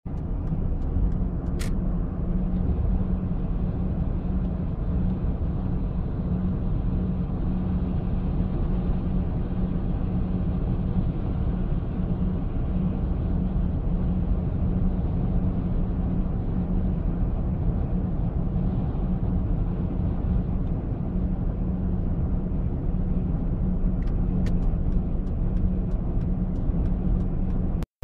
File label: Amb Car journy